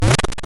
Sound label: Break; Pizza Tower early build test ost